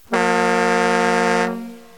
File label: Long Blast